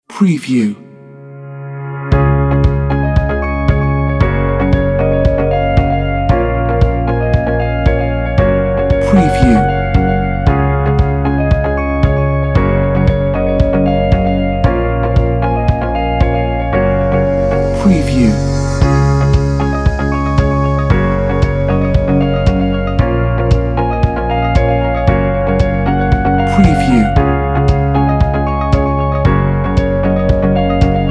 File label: Inspiring Journey